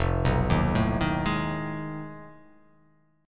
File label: F 7th Sharp 9th Flat 5th F down; F7#9b5 arpeggiated